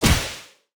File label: sfx skill, ZombieSkill SFX; IdleRPG2024